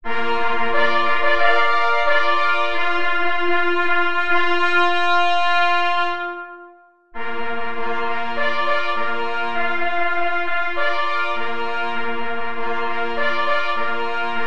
Marsz mysliwski na 3 plesy; R. Idzikowski

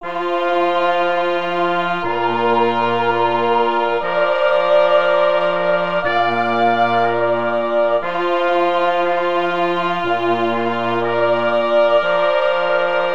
Mysliwska piesn zalobna na 2 plesy i parfors ze slowami; J. Rentflejsz

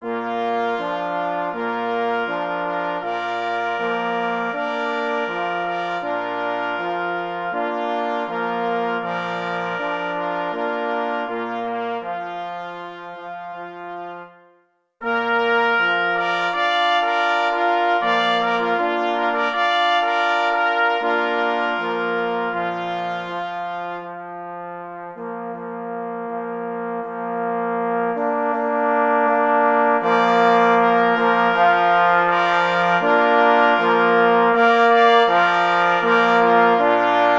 Ostatnie pozegnanie mysliwego na 2 plesy i 2 parforsy; Cz. Trybus

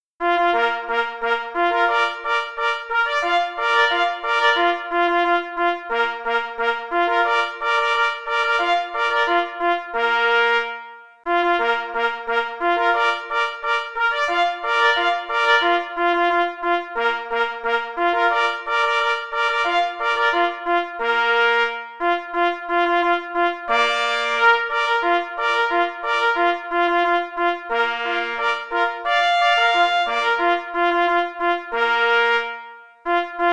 Marsz; J. Rosner

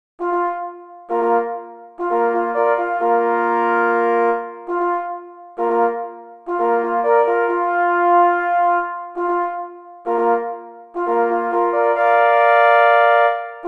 Kuropatwa na rozkladzie na 3 plesy; K.M.Kroskowski

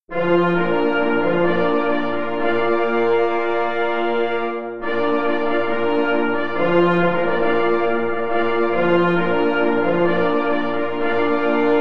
Na lowy na 4 plessy i 2 parforsy; Cz. Trybus; wersja oryginalna

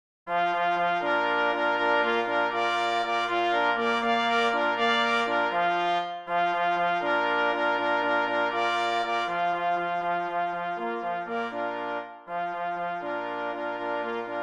na 2 plesy i 2 parforsy; Cz. Trybus; Echo Przechlewa

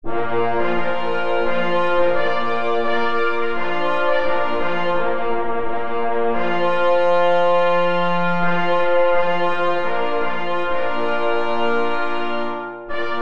Marsz na 2 plesy i 2 parforsy; Cz. Trybus; Marsz mysliwych

